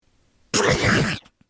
倒れる

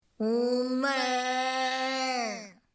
牛の鳴き声

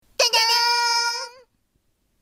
ジャジャーン！

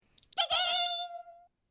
拍子木; 拍板; 声マネキング; 声マネキング対決; フブみこさん; ホロライブ; さくらみこ

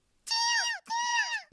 ヒヨコの鳴き声

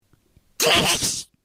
ゲームのパンチ音

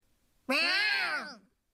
猫の威嚇

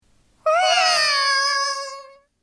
ダッシュ！

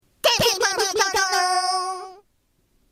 試合終了のゴング

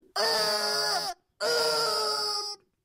スマホの振動音

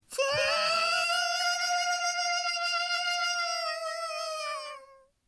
ヒグラシの鳴き声

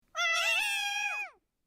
インドクジャクの鳴き声; 印度孔雀的鳴叫; 声マネキング; 声マネキング対決; フブみこさん; ホロライブ; さくらみこ

